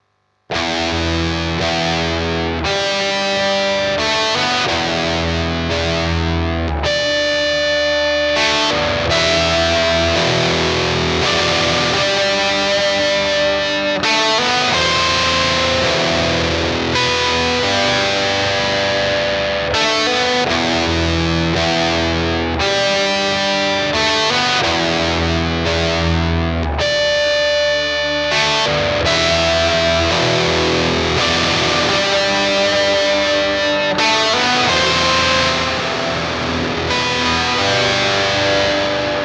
High Gain Meris Mercury 7

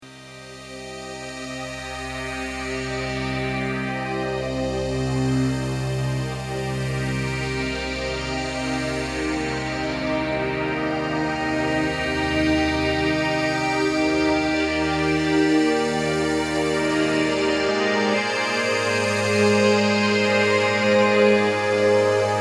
UDO Super 6 Slow Binaural Strings

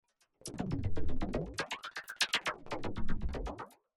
TC D-Coder Rubber Metal

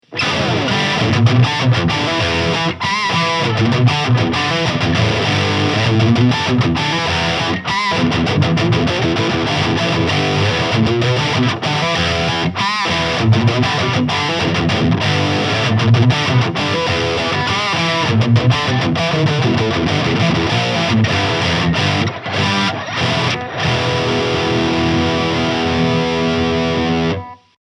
LTD MK-600 Crunch Active Mode

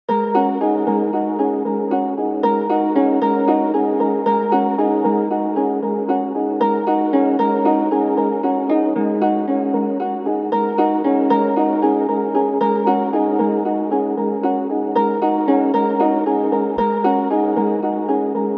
Delay Pluck

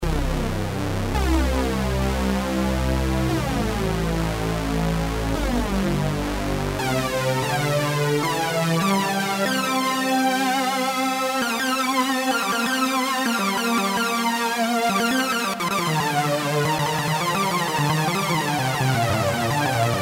ROLAND FANTOM JUPITER-8 Model Expansion

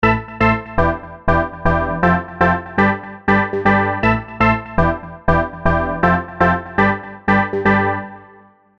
EZkeys 2 Electronic Pop House Detuned FM Key

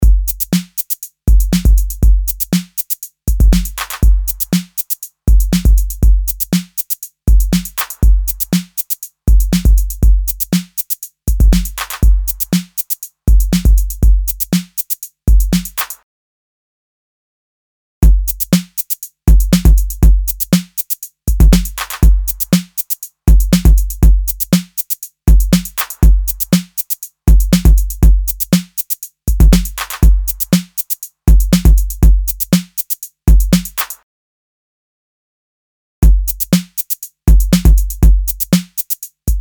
drumloop limiter; softube weiss gambit for console 1 demo

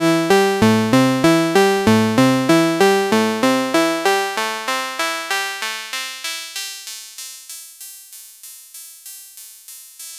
Filter HP; Bastl Thyme

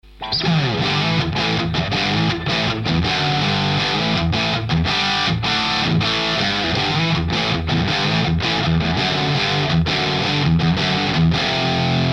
Scream; TwoNotes OPUS; Rebel

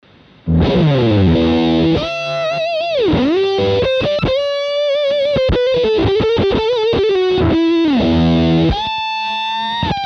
Mode V Gain Volate max Lead; EONS

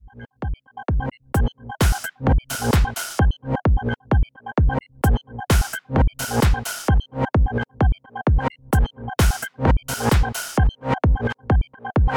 Rapid Loop Constructed; Parawave

